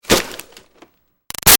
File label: Foley Box Hit Xmas Present; Crinkle; Hits; Objects; Big Room Sound